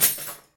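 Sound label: PERCUSSION CHIME, Trash Tuned; Roland L-CD701; Trash+Kitch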